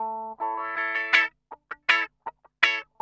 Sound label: GUI, 80 BPM